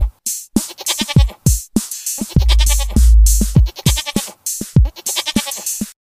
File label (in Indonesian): Kambing nyanyi